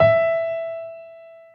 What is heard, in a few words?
drum hit whistle; osu!